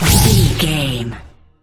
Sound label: sci-fi weapon blaster laser boom zap